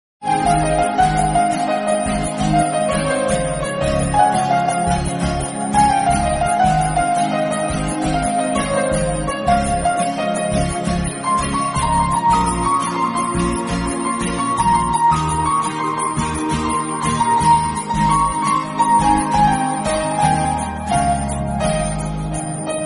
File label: Instrumental; Aashiqui 2